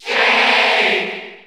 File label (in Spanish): Cheer Spanish; Sheik; SSB4; SSBU